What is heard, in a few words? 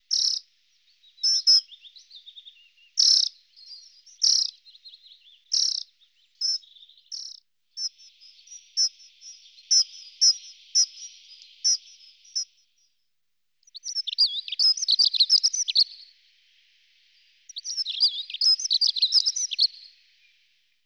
Hedge Sparrow